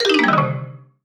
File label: Solo Xylophone Negative; MUSIC EFFECT; startide